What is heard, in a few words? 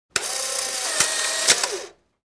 Polaroid Camera Ejec, instamatic